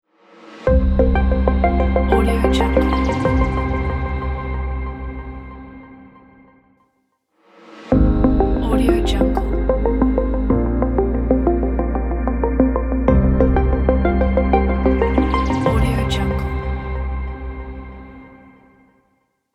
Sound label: news intro logo; red-ninja